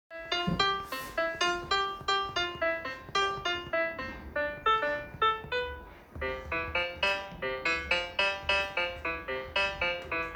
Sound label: piano